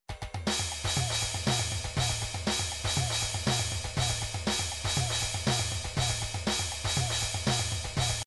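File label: drums pattern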